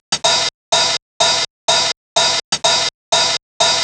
drum past hat 125, Drum Loops, Classic Chicago House